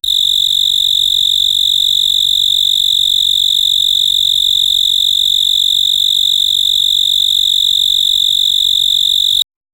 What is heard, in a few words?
insect